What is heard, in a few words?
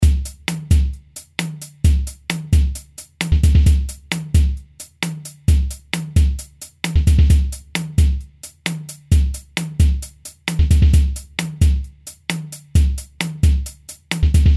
Action Rhythm